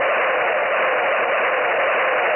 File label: Skid; Buggy; PS1